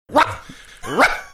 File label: barking